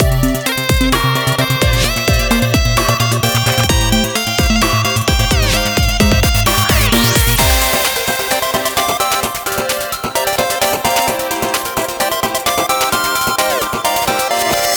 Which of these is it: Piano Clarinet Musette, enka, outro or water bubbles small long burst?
outro